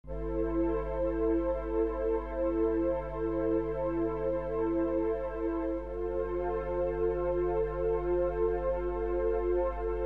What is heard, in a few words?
Pump up the bass